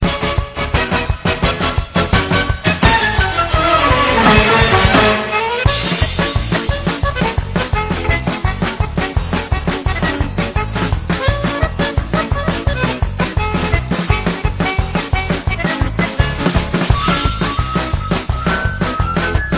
Instrumental

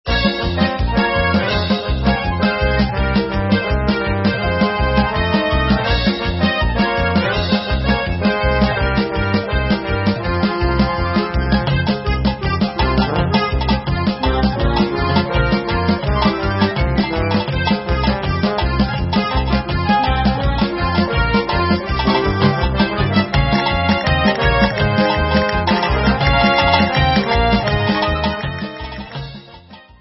Hora Instrumental

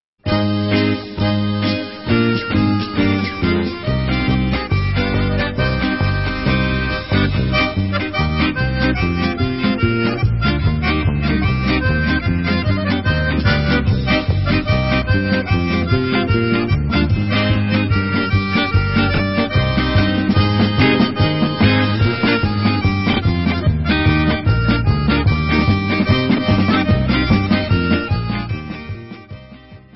Instrumental

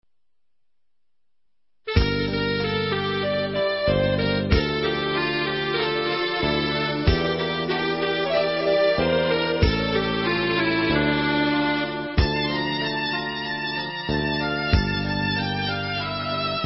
Instrumental

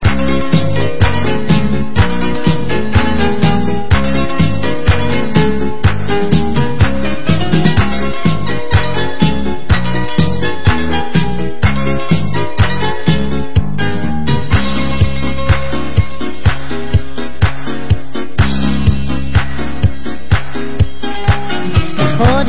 Mazal Tov Vocal

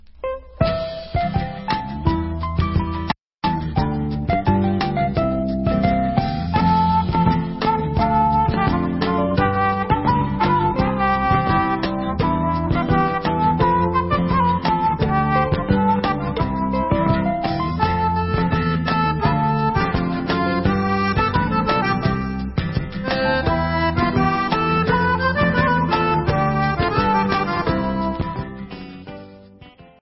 Instrumental

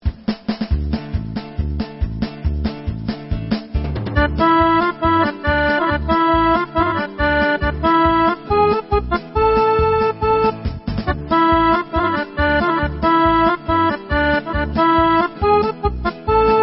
Hora Folk

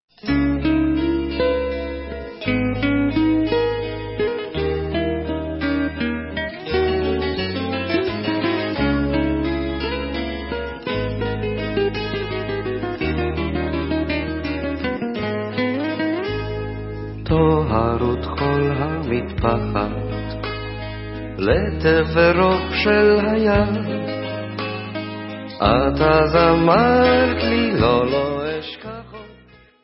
Vocal

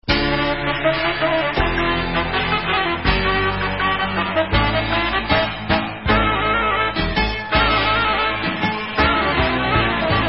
Instrumental

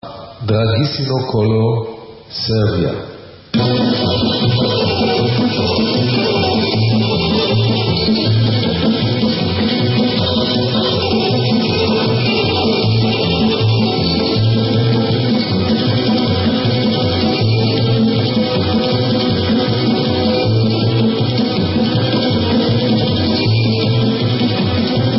Kolo Serbian Instrumental; Dragisino Kolo

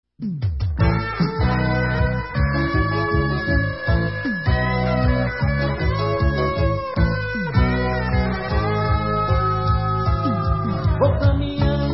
Brazil

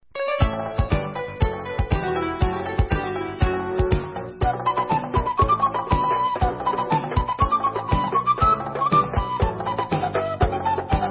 Instrumental

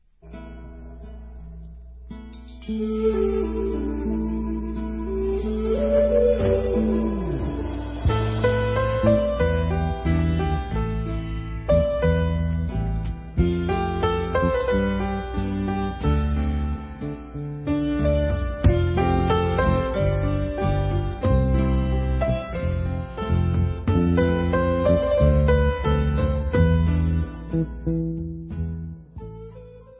Instrumental

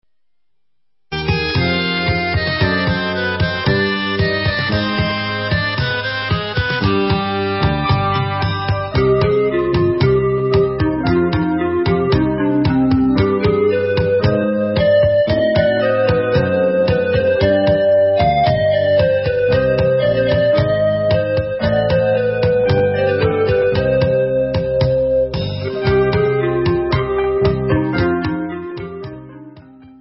Instrumental

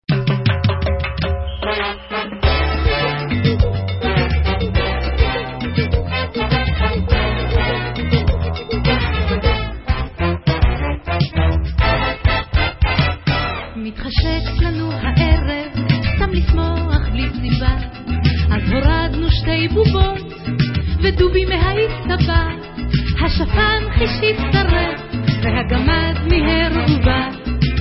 Samba

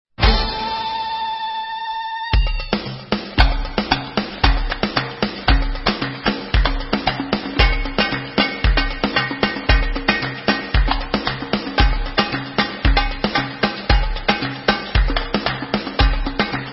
Debka Instrumental

